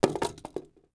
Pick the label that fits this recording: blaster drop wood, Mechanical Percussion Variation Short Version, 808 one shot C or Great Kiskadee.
blaster drop wood